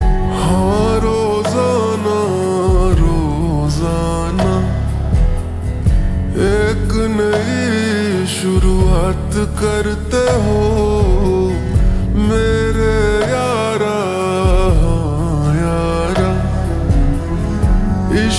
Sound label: Slowed Reverb